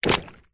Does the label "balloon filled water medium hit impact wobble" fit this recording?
Yes